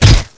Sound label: punch